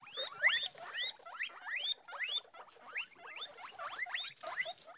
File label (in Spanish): CUYES